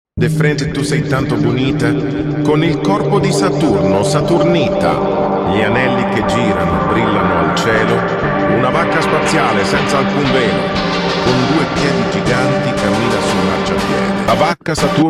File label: Funk slowed